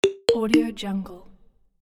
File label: message